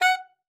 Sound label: F#5, alto sax; Xsample VOL-2